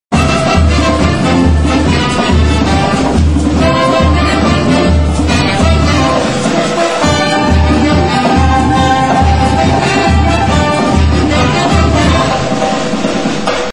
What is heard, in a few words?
jive samba